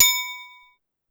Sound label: Bell, Clavia DDrum AT, Pitched Down